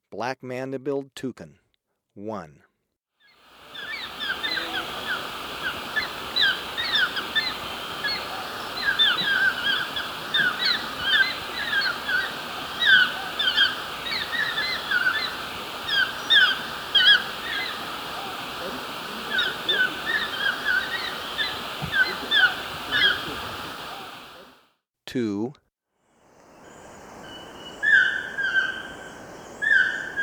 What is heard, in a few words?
Chestnut-tipped Toucanet, Birds of Ecuador; S. America